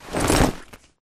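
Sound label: grunt movement foley loud long; Titanfall 2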